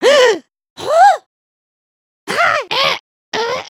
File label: grunts